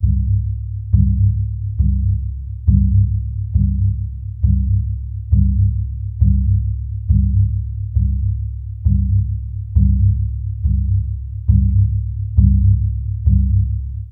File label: 068 giant native american drum, DRUM LOOPS and BREAKS; 000 to 080 bpm